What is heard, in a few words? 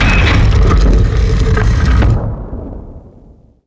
door open; DAoC; Toa